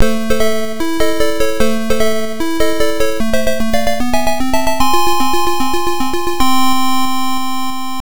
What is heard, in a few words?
1942 game over; arcade